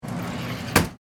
Cooler Door Close, Industry Grocery Store Cooler Door Slide Shut; Restaurant; Restaurants; BlastWave